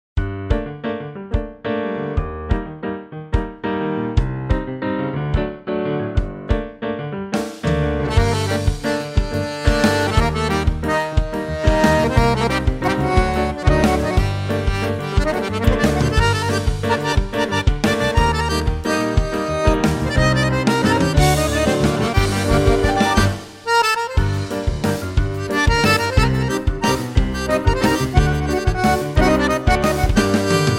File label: Accordion